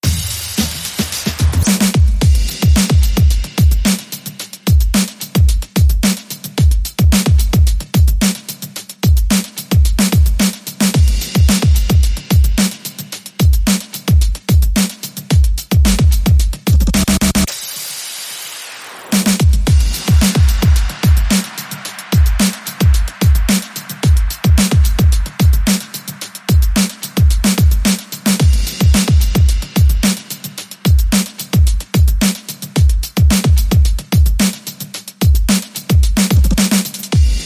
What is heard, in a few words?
Beat